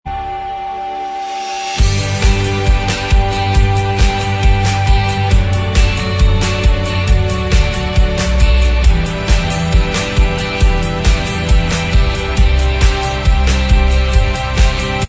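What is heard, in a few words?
Instrumental Track, backing tracks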